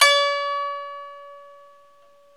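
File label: ethnic plucks, koto; Eth Plucks, Pluck Duo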